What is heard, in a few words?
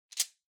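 Soroban Noise, abacus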